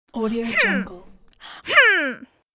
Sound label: female breathy offended voice